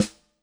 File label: Snare, Yamaha DTXpress-II